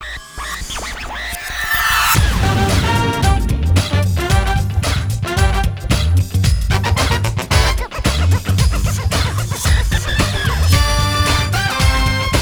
Broadcast Song; NBA Inside Drive 2004; xbox

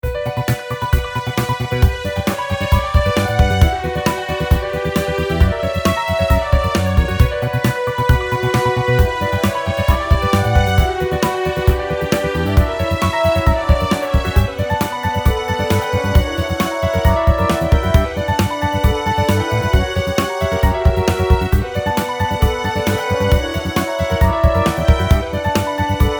Dragons Horn

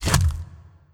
Crunch

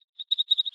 Insect Sing

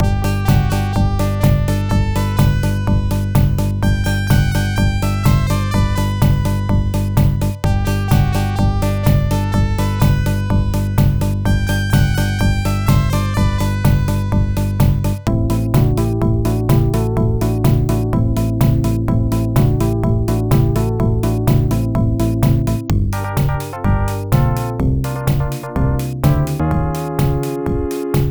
Menu Music; F1; Genesis

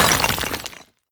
Building Particle Falls On Floor

